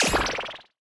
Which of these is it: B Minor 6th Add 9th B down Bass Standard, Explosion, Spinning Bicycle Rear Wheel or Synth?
Explosion